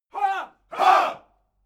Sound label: military shout ha